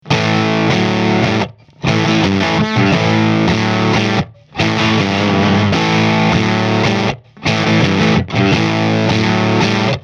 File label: fender 57 deluxe